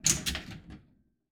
Pinball Flipper Hit Ball

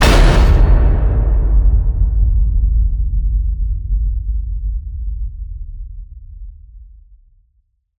HIT Massive, Cinematic Hits Designed

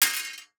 DICE on Metal, Throw and Roll, Mini, 2 Two Dice; Samples; ArticulatedSounds; DICE Sfx Party (Extended)

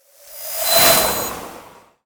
Ice Spell Whoosh, Cold Whooshes; Crystal Chill; Arctic Aura

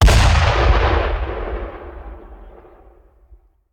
Mortar Cannon; Sound Ideas; Guns

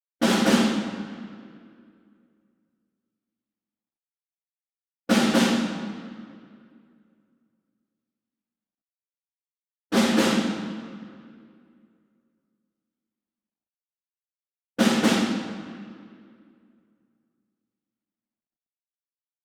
ENSEMBLE SNARE Group Double Far; boom library; Cinematic Strikes Construction Kit

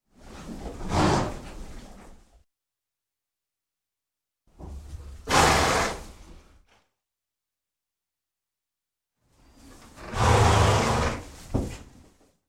Horse Snort; Sound Ideas; Series 2000

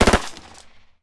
gunshot; goon; Blind Drive

